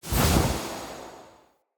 Staff Caster Cast Long, Weapons; Player; Staves; Galene

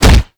Fighting Blocked Impact, Weapons; Warframe

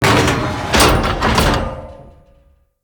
Siege Engine Damage Impact; Weapons; Enemy; Artillery; Ode Siege Engine

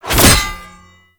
Bayonet Melee Combo; TnBayonet; Warframe; Tenno; weapons

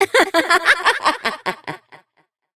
Evil Twin Doppelganger Laughter

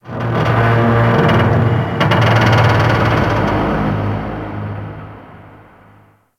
Ocean Underwater Metal Creaks Large; Warframe; Grineer Ocean

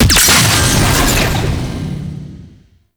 Sentient Vessel Weak Point Hit; Warframe; Tau; Hit Notifications

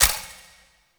Countdown Tick; UI; Undercroft; Warframe; Duviri

